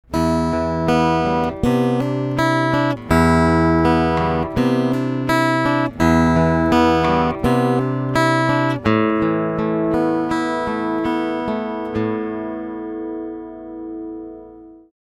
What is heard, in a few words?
Picking EQ